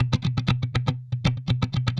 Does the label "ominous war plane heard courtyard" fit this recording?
No